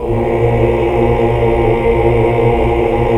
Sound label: AAH 2 2 R; Best Service Gigapack 1